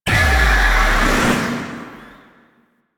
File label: Unhallowed Cast; Warframe; Warframes; Dagath